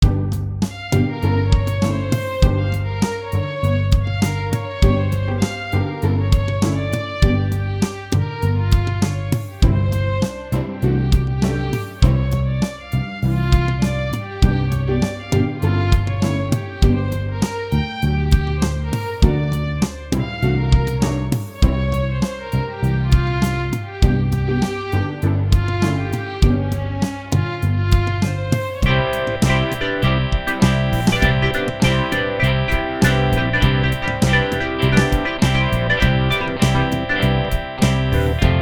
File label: tango blues